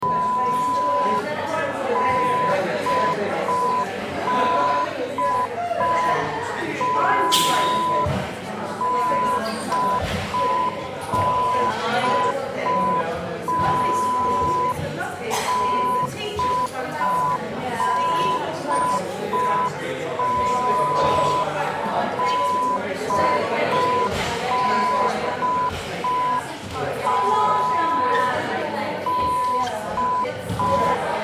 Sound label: Party Atmos Hall Quiet